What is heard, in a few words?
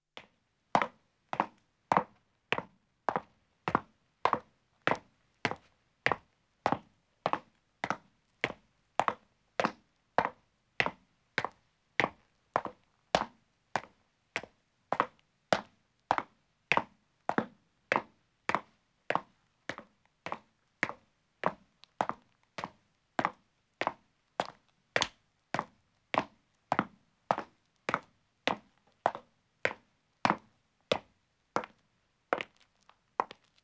Stone Stairs (Echo) Descending, footsteps; BBC Sound Effects Library; Footsteps II